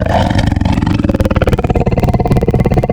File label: Tiger, Animals for Serum Wavetables; Synthetic's Serum Collection; Cymatics - Animals for Serum - Gold Edition Wavetables